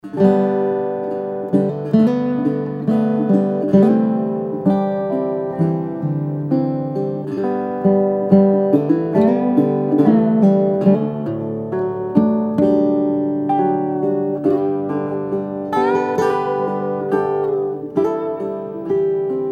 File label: National and Dobro